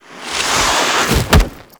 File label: Slides Hits Ledge Foley; Warframe; The New War; Throne Room